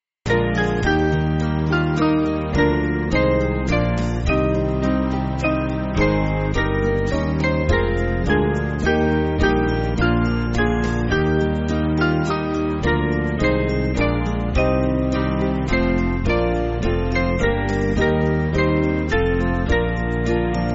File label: Band